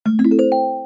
payout

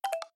button click

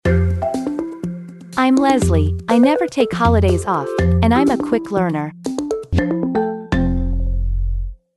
leslie

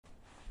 walk

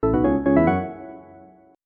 jackpot

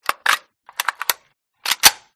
vss reload, guns; resurviv

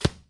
arrow impact

